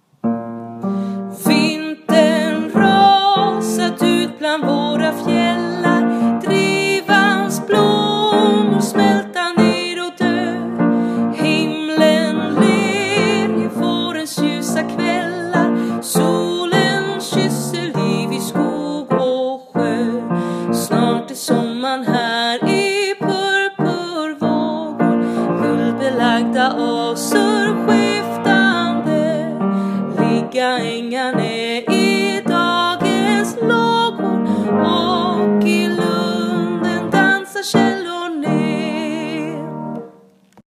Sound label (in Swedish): Vintern Ra Melodi